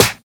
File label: airy sizzle steel snare drum sound a sharp key